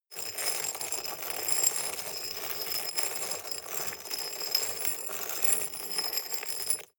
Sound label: scrape stone shard soft